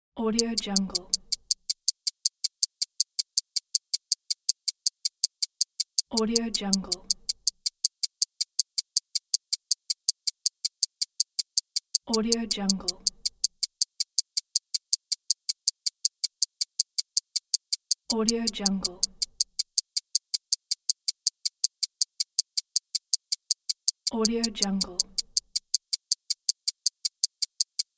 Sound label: stopwatch ticking